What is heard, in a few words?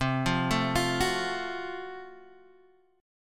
Gb Minor Major 7th Flat 5th, chord